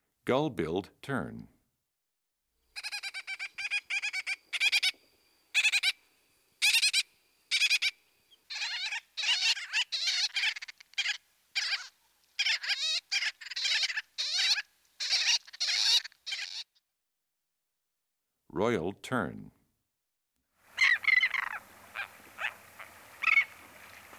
Gull-Billed Tern, Royal Tern, Caspian Tern, Least Tern, Arctic Tern, Common Tern; Forster's Tern; Roseate Tern; Black Tern; Bird Songs Eastern-Central